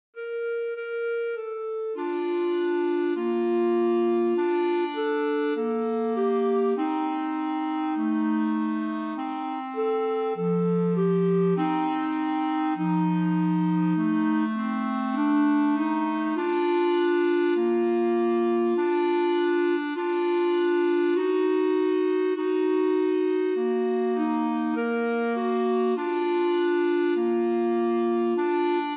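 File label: o sole mio clarinets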